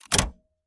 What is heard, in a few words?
stop